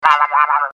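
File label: pyanenkij robot, drunk robot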